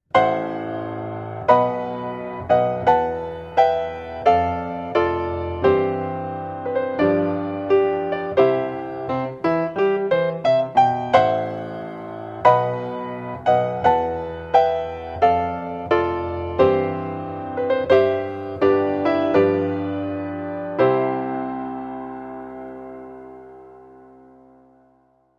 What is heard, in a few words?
Mendelssohn march, solo piano; wedding music